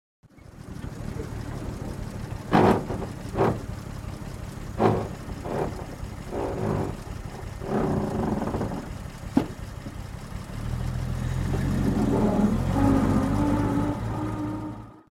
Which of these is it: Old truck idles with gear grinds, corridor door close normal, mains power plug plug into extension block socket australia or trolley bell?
Old truck idles with gear grinds